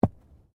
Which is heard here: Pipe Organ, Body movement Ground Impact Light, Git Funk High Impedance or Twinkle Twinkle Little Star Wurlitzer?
Body movement Ground Impact Light